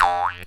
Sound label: spring